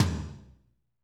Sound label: TOM M R, Drumscapes Roland